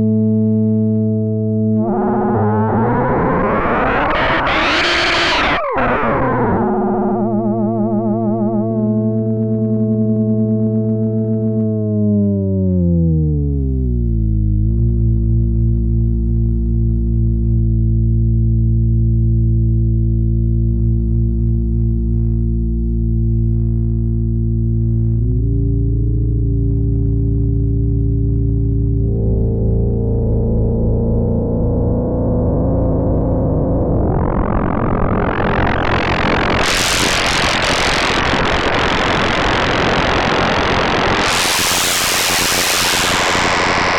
spectraphon chaos; Make Noise

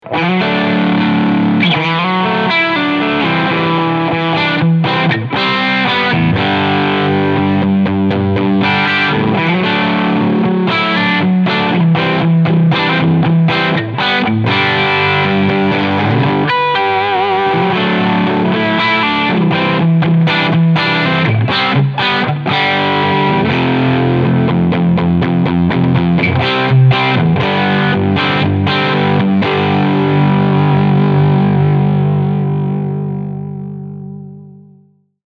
AC Norm Full Gain; Quilter Superblock UK

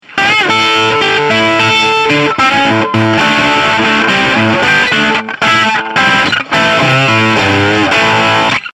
Tweak Fuzz

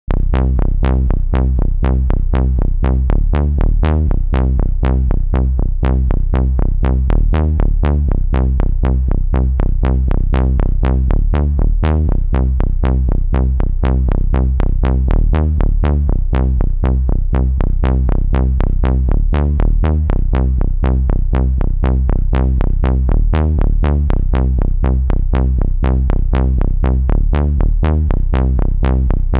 Walking Trance Bazz

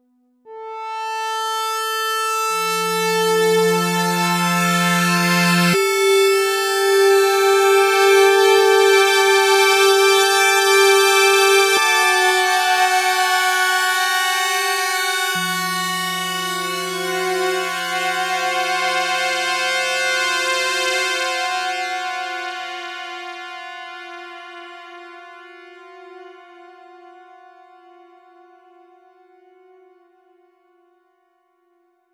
Moog Mariana 4 Voice Polymoog

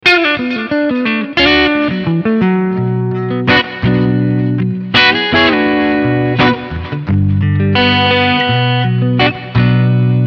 Clean Picking Front HB

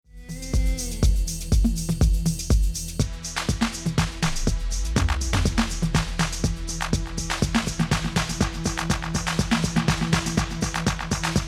400 MS Wet

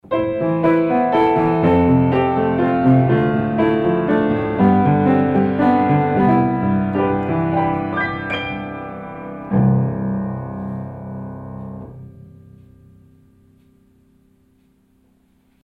Sonic Port VX Piano Stereo Gain10 direkt

